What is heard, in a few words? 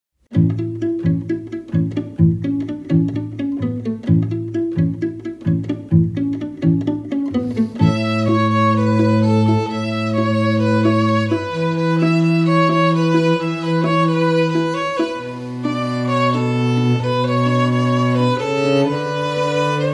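quartet, String Ensemble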